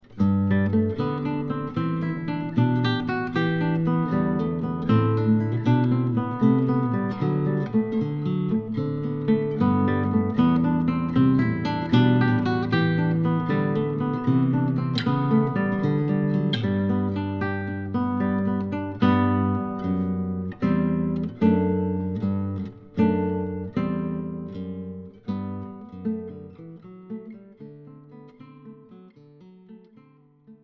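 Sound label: Classical guitar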